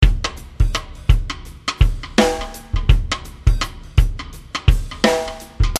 Jazz Shuffle

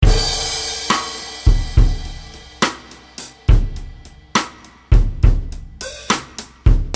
Slow Triplet

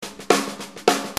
Ballroom Beat

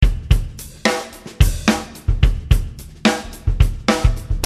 Synco Shuffle

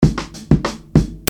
Jamaica Rim

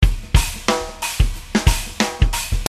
Hip Hop

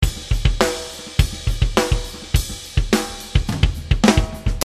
Power Rock

